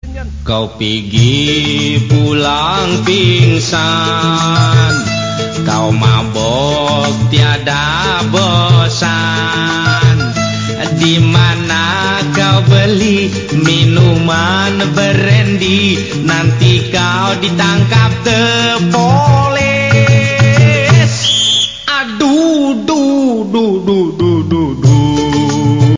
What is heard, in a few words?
classic ringtone